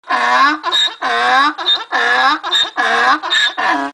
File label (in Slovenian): EFEKT OSEL